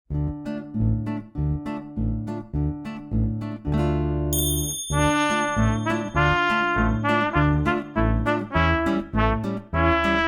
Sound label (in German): Duett, trompeten